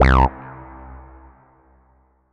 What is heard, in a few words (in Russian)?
ejsid haus odinochnij v prostranstve vibriruyushij